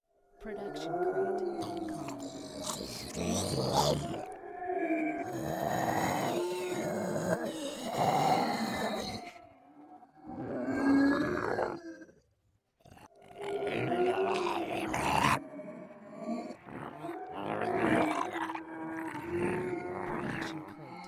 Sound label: zombie; soundscrate